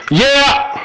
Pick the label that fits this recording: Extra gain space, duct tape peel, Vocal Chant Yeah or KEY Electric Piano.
Vocal Chant Yeah